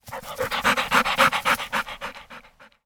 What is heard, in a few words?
animal dog panting fast short recording